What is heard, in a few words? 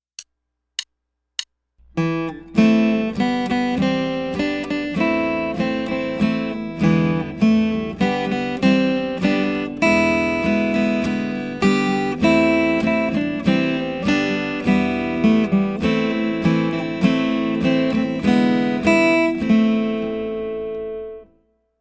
Tudor Dance